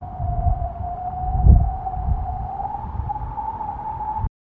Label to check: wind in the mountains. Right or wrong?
Right